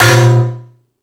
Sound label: Thundadome, E-MU Pro-Cussion; drum machine